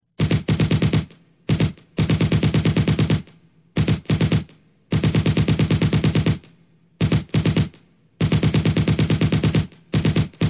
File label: Gunshot War Game